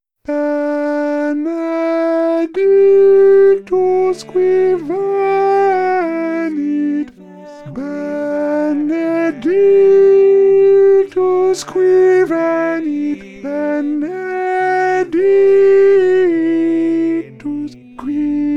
SOPR Loud

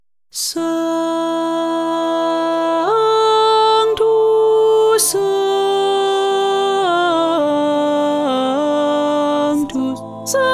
1st alto, mass; cristobal morales

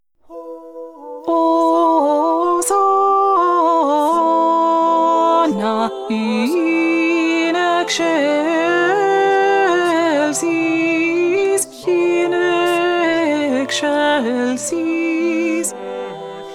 2nd Alto

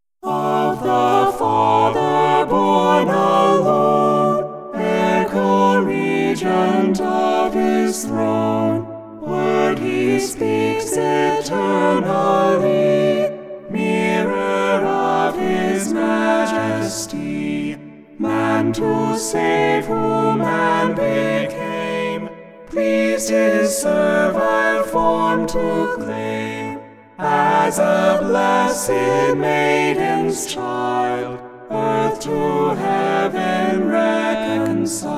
Hymnal; Hymnal number 876

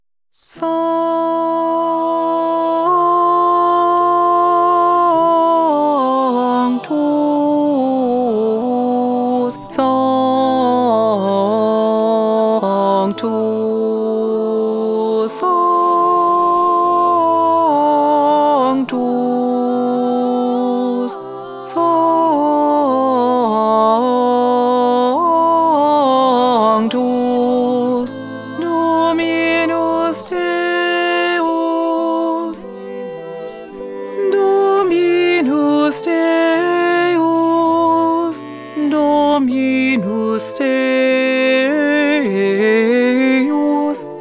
2nd ALTO, mass, polyphony; cristobal morales

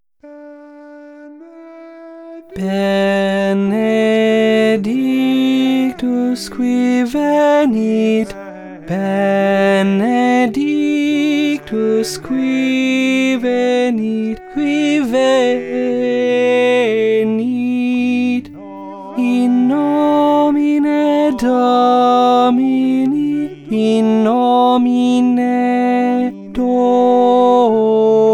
ALTO Loud

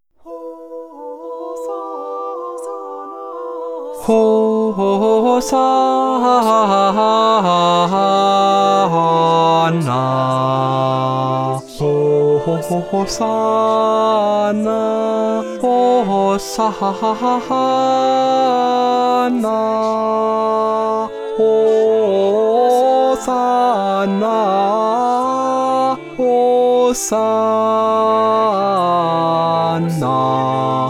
2nd Tenor; PLENI SUNT Cappella Sistina MS 17 “Missa Mille Regretz” (Morales)

